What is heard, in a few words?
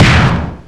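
Rivet Hit Exterior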